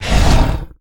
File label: Sniff; Evolve; Goliath